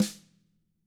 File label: TAM3 HIT, Drums, TAMA; ILIO; Double Platinum Drums 1; TAMA+TAMA